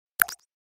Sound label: Message pop up